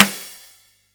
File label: drum hit normal; osu!; Luminance - Fluorescence